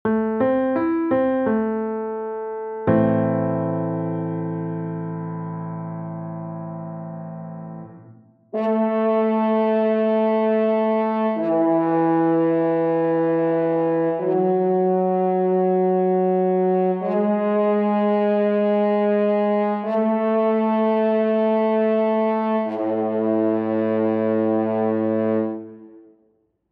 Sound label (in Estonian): Metsasarv; a-moll